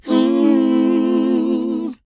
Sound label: WHOA, R&B & Dance vocal; ILIO; Vocal Planet